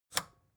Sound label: record player power push on